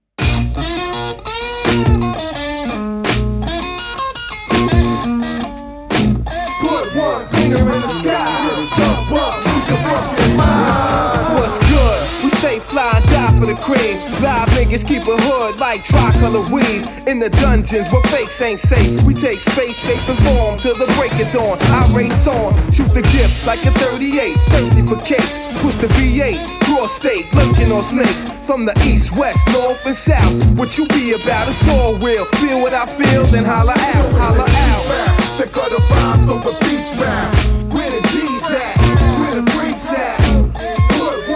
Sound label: Street Rap